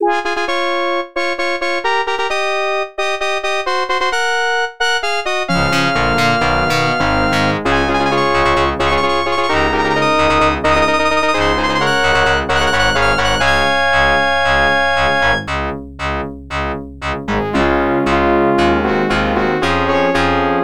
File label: LAST ROUND CLEAR; Black Tiger; Black Dragon; arcade